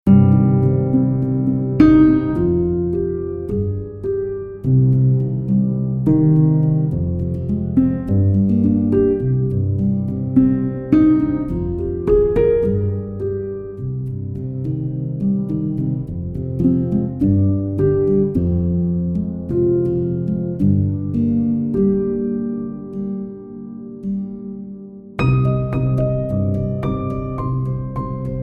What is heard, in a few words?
piano impro